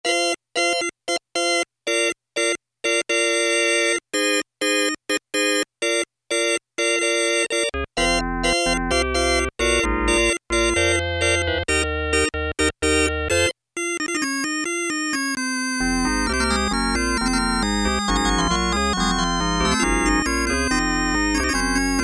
E.ORGAN

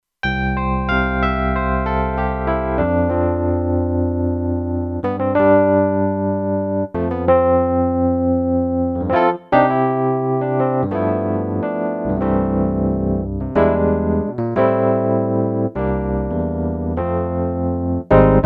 3-Sec E Pno, Kurzweil K2500X

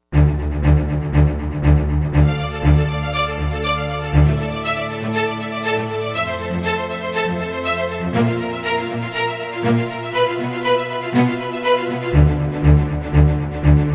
Speed Ostinato

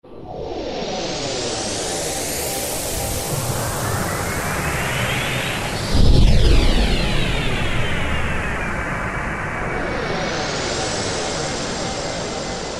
Jet, E-mu Proteus-1; Presets

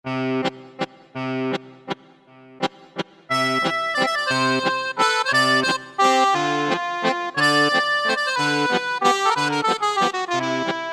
Accordion It, Roland SC-50 Sound Canvas; Factory sounds

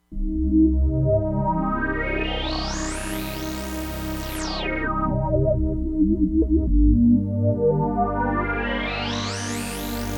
SWEEP, Crumar Bit 99; Factory Programs

